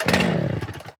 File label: Engine Start; Ed Edd n Eddy; Adromulus Pack; EEnE